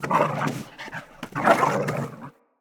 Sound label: Pack Wolf Run Grunt; Animals